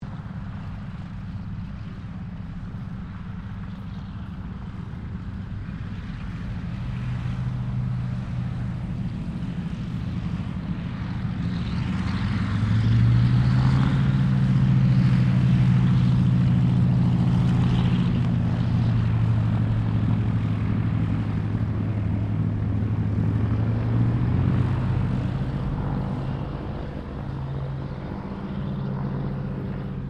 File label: AIRCRAFT DOUGLAS DC3 TAXIING; 1945 DC commercial aircraft; Airplanes; Vehicles; Aircraft Collection; Twistedtracks